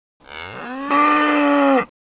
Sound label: Oestrus chaos Heifer